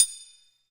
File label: Triangle Closed